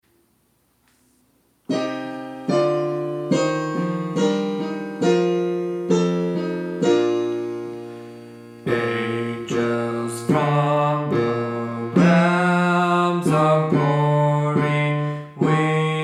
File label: Bass